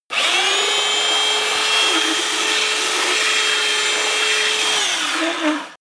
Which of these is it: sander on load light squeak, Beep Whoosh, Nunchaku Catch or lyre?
sander on load light squeak